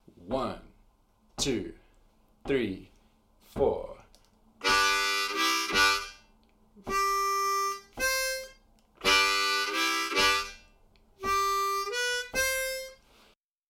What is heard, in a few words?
Lick w Chugg, harmonica